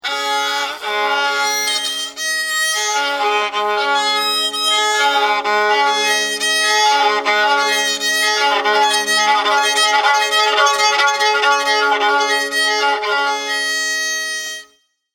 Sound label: Tuning Violin